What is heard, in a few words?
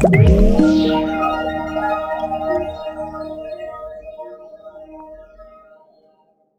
Level End